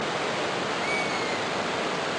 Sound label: Black-and-yellow Broadbill